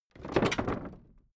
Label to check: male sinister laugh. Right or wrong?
Wrong